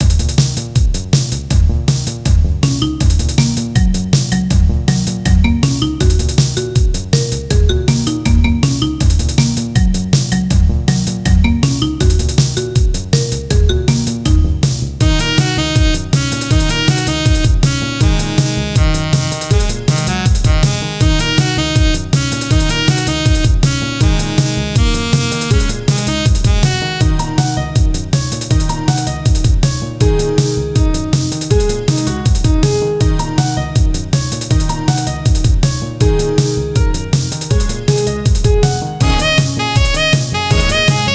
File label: music